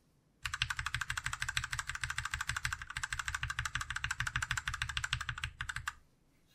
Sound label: Gateron Milky Yellow ESC; SGK50 S4